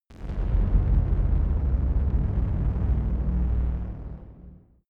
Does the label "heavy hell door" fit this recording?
Yes